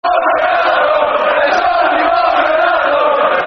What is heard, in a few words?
Football Chant (Soccer Song), Man United; There's Only One Ronaldo